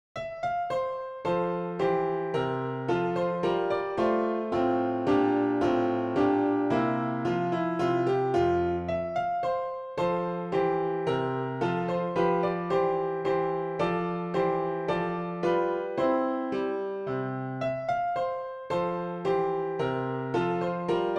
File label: Dance PianoTeq Bechstein